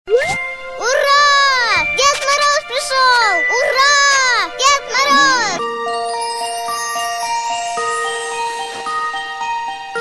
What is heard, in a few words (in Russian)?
Рингтон Новогодний; Маша и Медведь